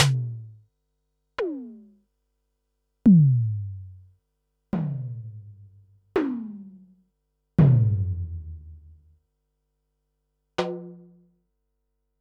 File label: Pearl SC-40 Toms